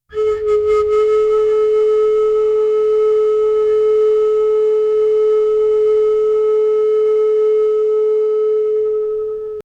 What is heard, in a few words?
flute sound; lord krishna